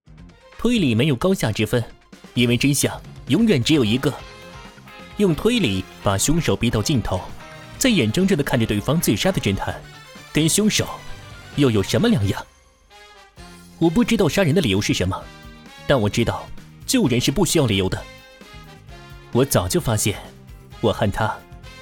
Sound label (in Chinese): 音乐; 名侦探柯南 工藤新一 台配; 日漫